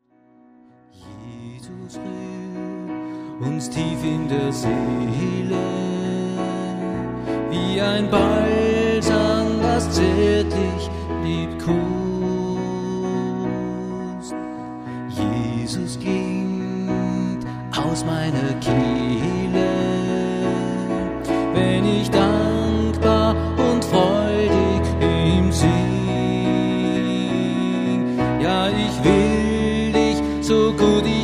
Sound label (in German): Wasser ewigen Lebens; tauflieder